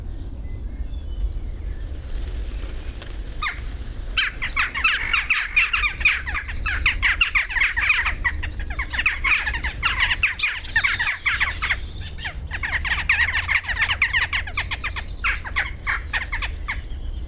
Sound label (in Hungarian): csóka hangja